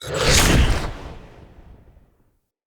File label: Staff Cast Short; Weapons; Enemy; Staves; Mnd Wazard Staff